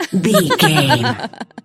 voice female laugh

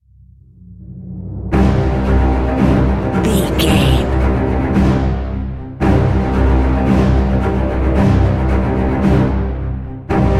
cinematic darkness falls

